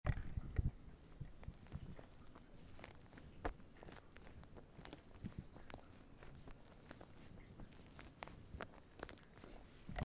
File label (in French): ambiance nature petits pas; college vallee du thore